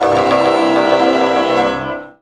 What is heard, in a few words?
ZIMB HIT; Best Service; World Colours